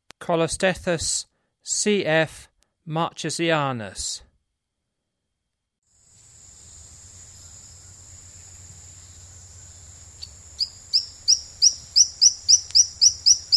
Colostethus cf marchesianus, Frogs of Ecuadorian Amazon; S. America